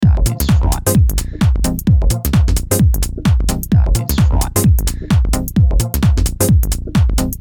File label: 130 Fm Groove